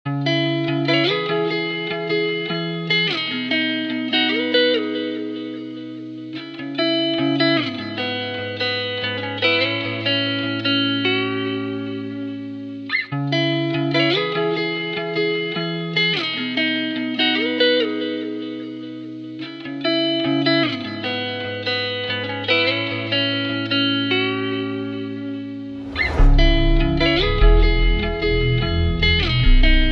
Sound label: Guitar; cymatics; journey guitar loops